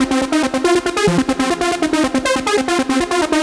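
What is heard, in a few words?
Leader, loops; техно транс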